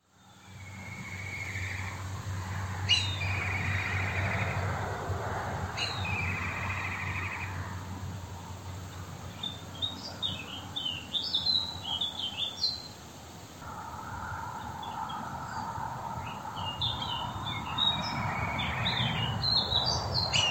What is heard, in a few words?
Black Kite Sound